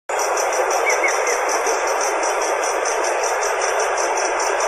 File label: Stephanoxis lalandi; PP Salto Encantado; Jorge La Grotteria